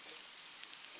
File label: Background Noise Clips